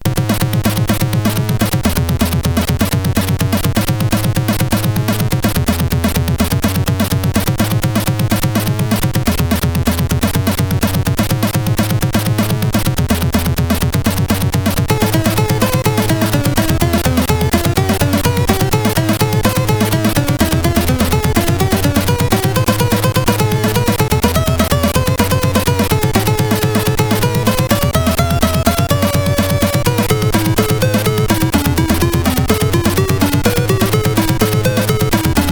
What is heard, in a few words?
sid, CSG8580R5; hvsc